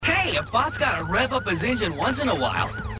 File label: Bot Rev Engine; Sideburn; Robots In Disguise television show